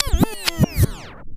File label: Misc Rewind